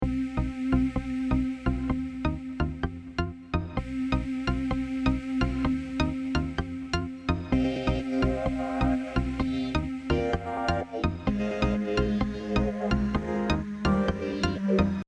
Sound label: char selection screen